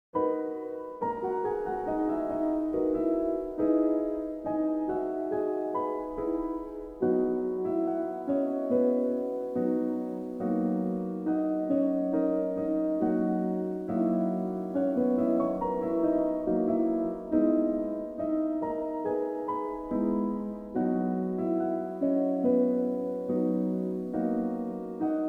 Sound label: Relaxing Piano Jazz Music